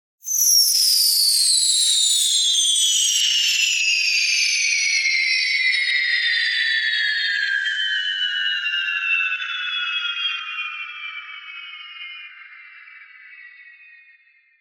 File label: Falling Bomb, Whistle - Long Fly; Hollywood Edge; sound designer's toolkit v2